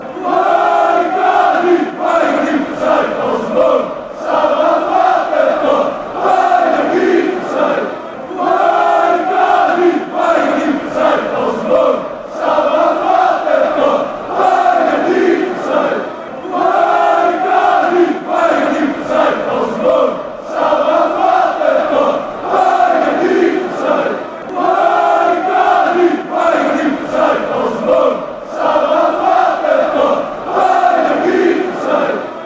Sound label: chant, fan chants